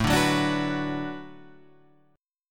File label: B-Minor